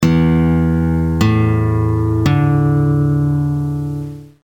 guitar

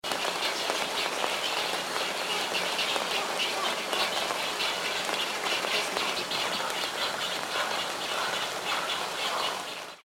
rain on wood storks

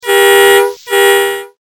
bus horn

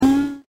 camera in

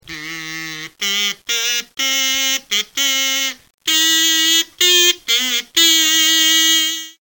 kazoo

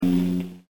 bolt, Boing; Mono

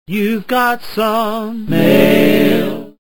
Mail

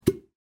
POP

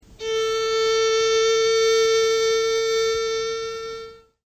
violin A4

